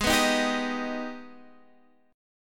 G sharp Minor Major 7th G sharp down Guitar Standard; strummed; capo=3